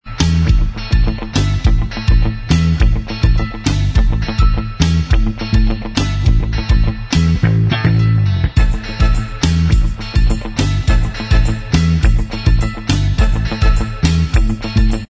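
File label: Instrumental Track, backing tracks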